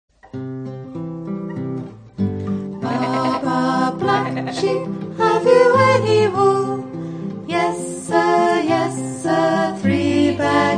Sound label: baa baa piccolo song